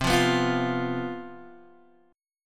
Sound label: C Major 7th Suspended 4th, down, Guitar, Open D